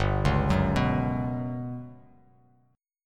G Major 7th Flat 5th, Bass Standard; arpeggiated; JGuitar

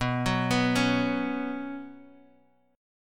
B Suspended 2nd, Guitar, Half Step Down

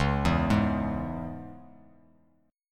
C sharp Minor; C#m; arpeggiated; JGuitar

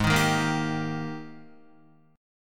G sharp Major 7th Suspended 2nd, Guitar; strummed; JGuitar

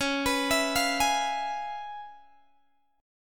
C sharp 7th Sharp 9th Guitar Open G, chord

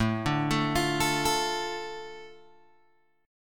A Suspended 4th Sharp 5th, Guitar Open G

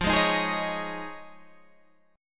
F Minor 7th Sharp 5th, Guitar, Standard; JGuitar

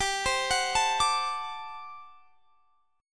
G Major 7th Suspended 2nd Suspended 4th G down; GM7sus2sus4; arpeggiated

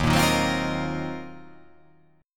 D sharp Minor 6th Add 9th D sharp, chord

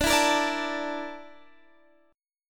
A sharp Major 7th Flat 5th

